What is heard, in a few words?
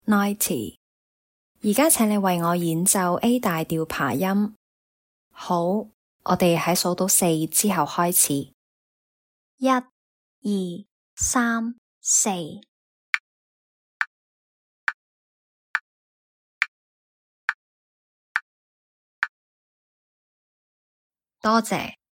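A major arpeggio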